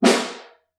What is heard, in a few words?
Snare Far